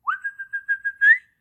dog animal whistle call; SoundsCrate

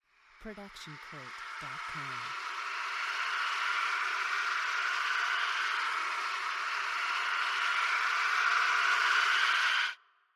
rising screams; soundscrate

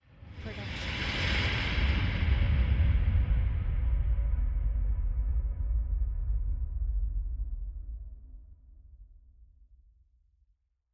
Airy Riser

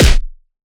Anime Super Punch

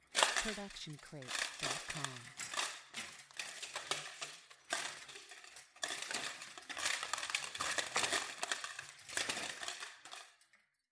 curtains; soundscrate

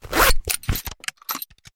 zippers and duffle bag

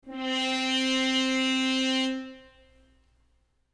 mute horn

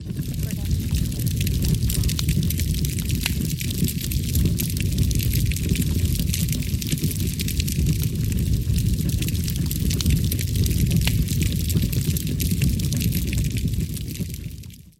Large Fire; SoundsCrate

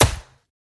Gun Shot; SoundsCrate

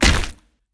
body impact ground

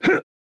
man attack light; soundscrate

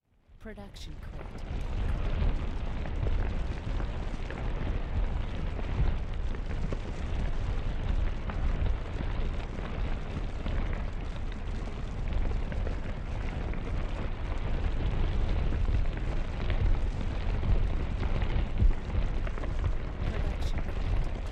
Big Fire

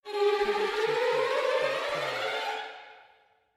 short trem

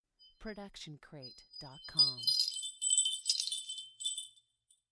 Wind Chimes C

